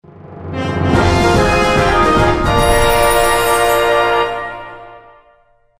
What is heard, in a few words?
Jingles; otome the exorcist